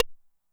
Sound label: RIM SHOT, 909mod; classic machines